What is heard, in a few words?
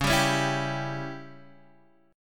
Db Diminished Db Guitar Open D